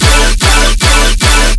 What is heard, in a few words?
bass loop basic womp 150 D Maj; BOBA